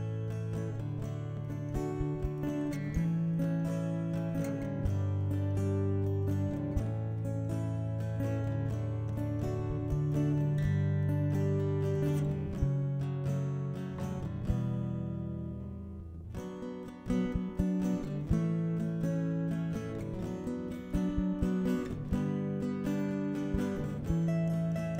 guitar; SiSEC11

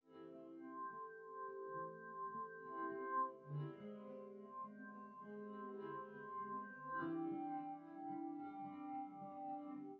nodrums

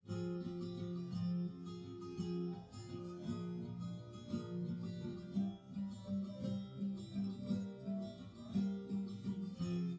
no drums live rec

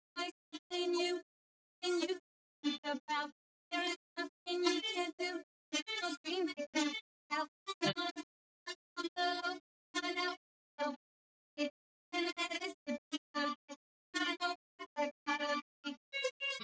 vocals; SiSEC11

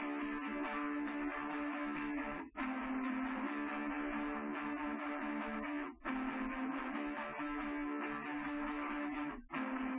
wdrums liverec

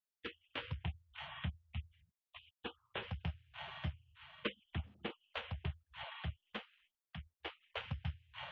wdrums inst sim; SiSEC10; underdetermined

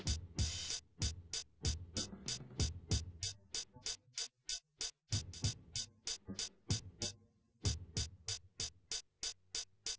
wdrums inst sim; SASSEC07

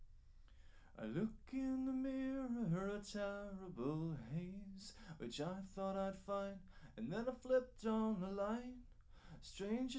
no drums live rec 5cm; SASSEC07

